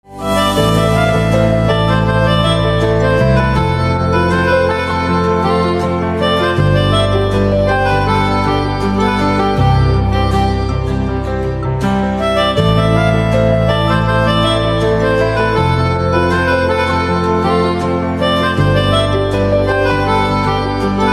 Pianica Instrumental Ringtone

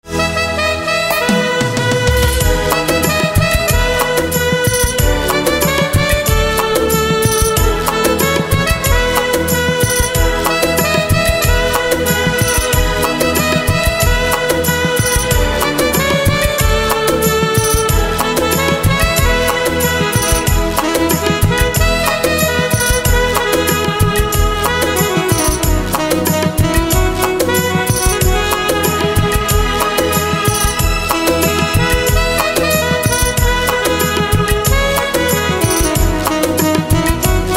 Saxophone, instrumental ringtone

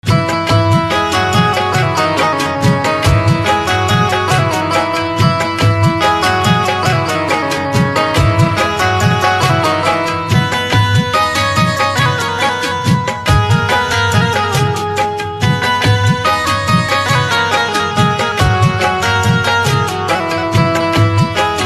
Banjo Instrumental Ringtone

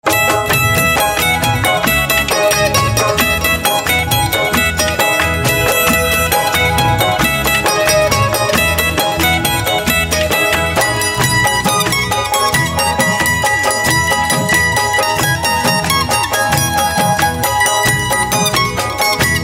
Mandolin

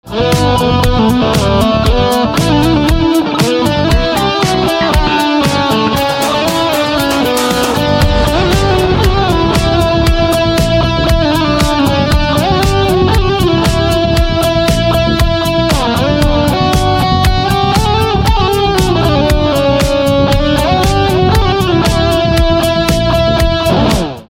Guitar Electric

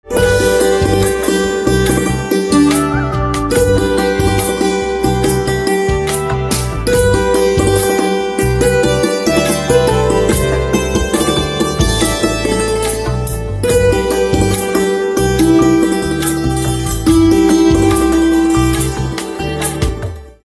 Santoor

